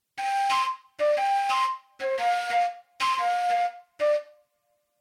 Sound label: Fula Flute